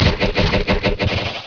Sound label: fan hit; mk2; Kitana